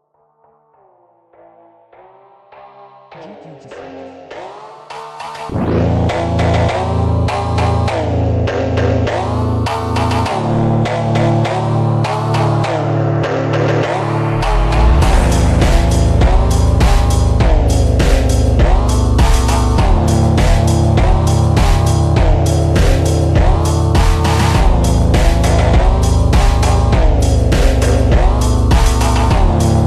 slowed reverb

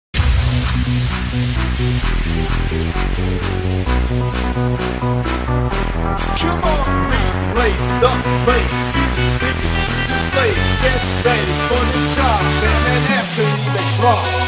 dance music

